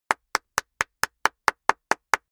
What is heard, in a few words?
clapping fast